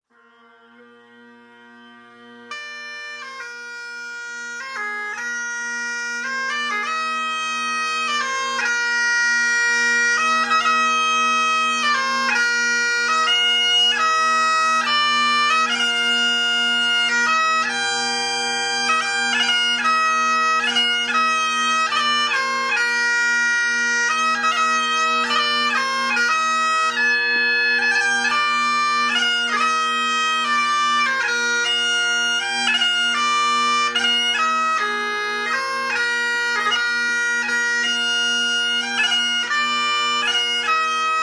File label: bagpipes